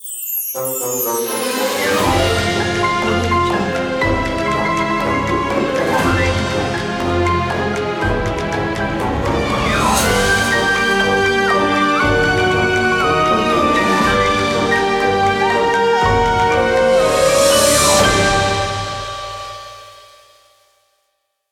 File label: halloween logo pack; sonorius